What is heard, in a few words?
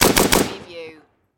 Machine Gun Bren